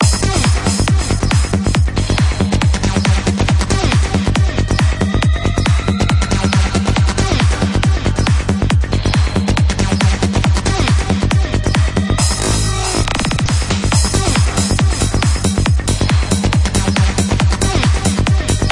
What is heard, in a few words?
PSY KIT; DMS